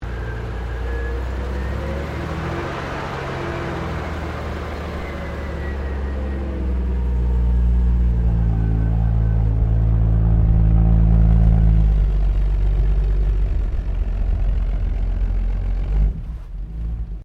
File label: CAR HOLDEN HD PREMIER MANEUAVER, Classic Australian cars